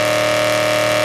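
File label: engine exhale, advanced engine sounds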